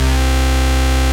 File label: Basses Root C; Vengeance Samples